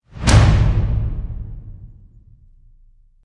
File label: Evil Whoosh; Gothic Storm Music